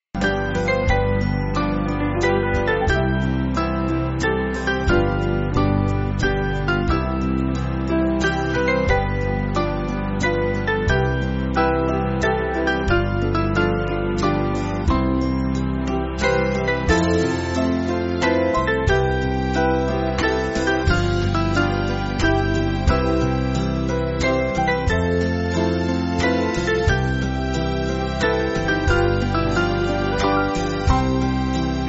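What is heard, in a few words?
Band, Backing Files for Churches; While We Pray; Case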